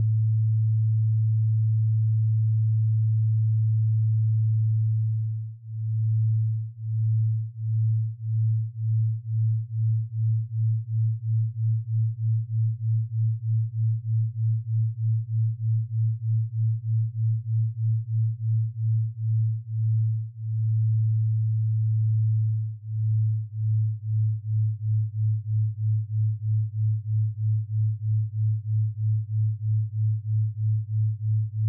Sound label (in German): Schwebung sinus